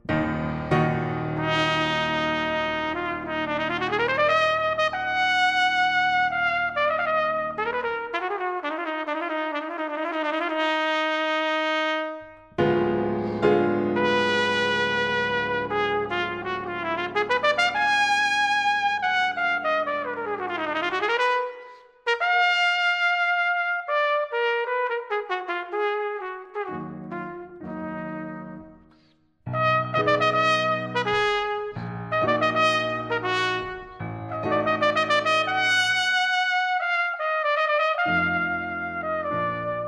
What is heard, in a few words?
Trumpet; Slavische Fantasie